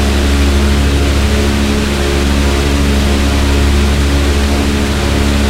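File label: b25 cockpit right, engines; War Thunder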